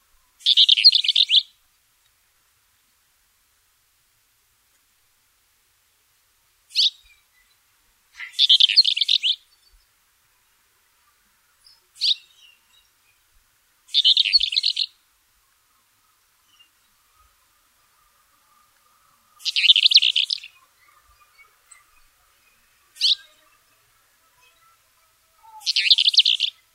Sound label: ruppells warbler